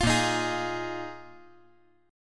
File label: A sharp 7th Suspended 4th A sharp